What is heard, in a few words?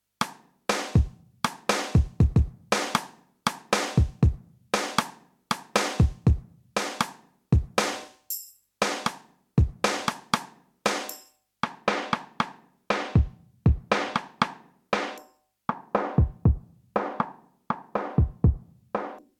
KORG MPS10 Pads Filter